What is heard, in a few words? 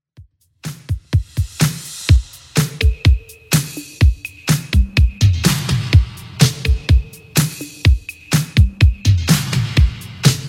80s New Wave Redrum 125bpm